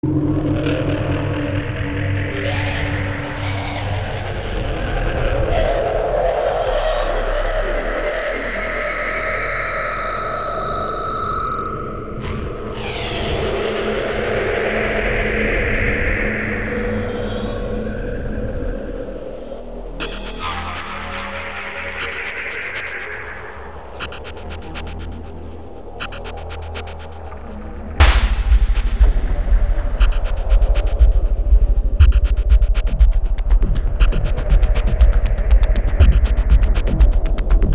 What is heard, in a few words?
Spooky Office loop